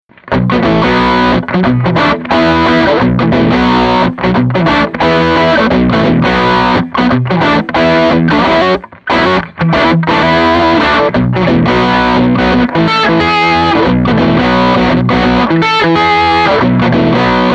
marshall tone

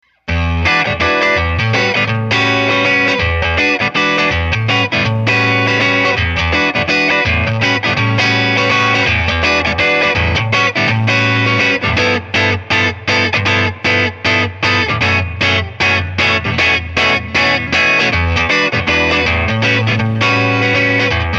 Strat Neck PU Brite Down

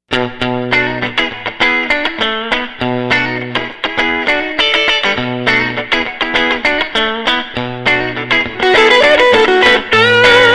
Tele Rhythm Strat Lead

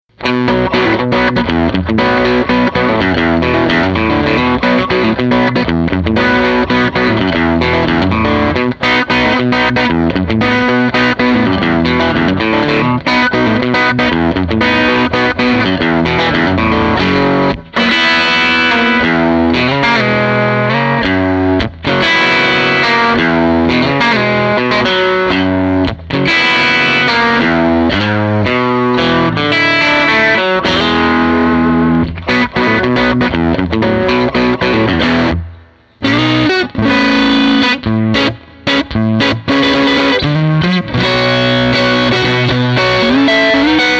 tweed tone

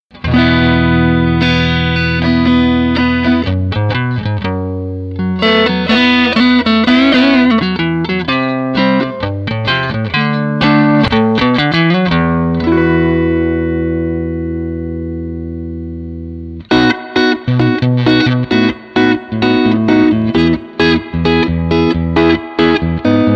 blackface tone

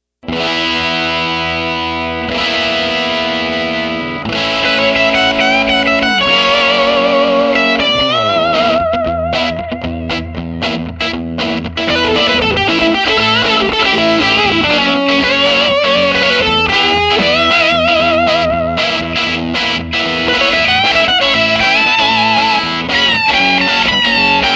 Both Input Rhythm and Lead S.G. Bridge

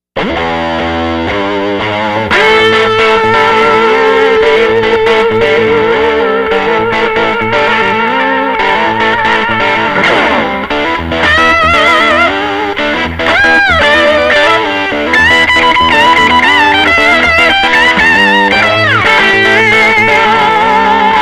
SG Rhythm T.H. Lead

